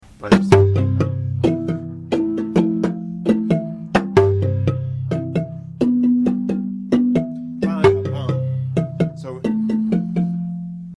bari, marimba; chikende

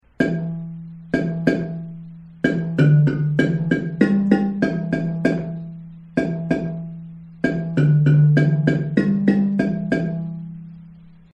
bari chorus, marimba

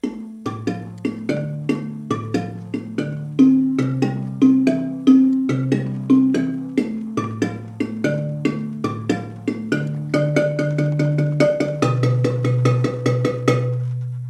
bari c part, marimba

